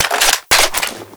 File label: Reload; Modern Weapons Pack